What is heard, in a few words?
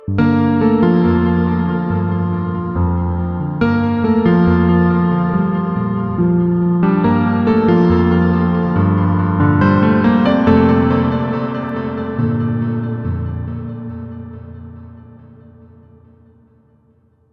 royal piano